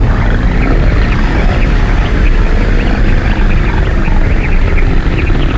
hover, waste scanner; npc; hl2mp

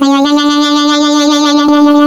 turret fizzler; npc; hl2mp

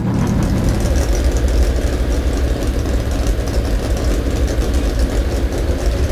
throttle off slow loop, vehicles junker; hl2mp

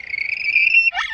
antlion grub idle; npc; hl2mp

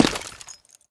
gear, combine soldier; npc; hl2mp

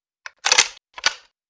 fidget, weapons gluon

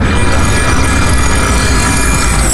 hover alarm, waste scanner; npc; hl2mp

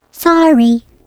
turret collide, npc turret floor portal; hl2mp

